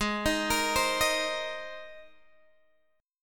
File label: Ab Major Add 9th Ab Guitar Open G